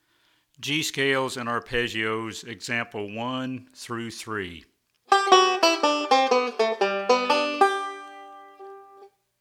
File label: Scales And Arpeggios